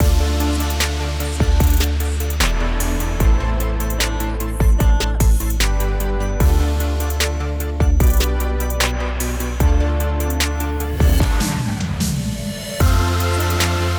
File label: LIMITER ABUSED